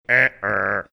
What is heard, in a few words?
making uh oh robot sound